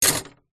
industrial small metal hand tool drop into metal tool box